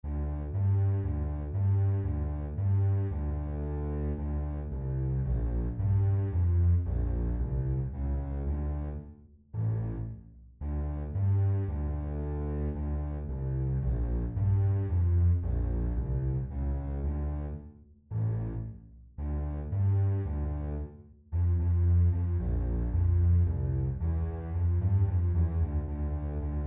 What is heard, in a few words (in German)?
Czardas Kontrabass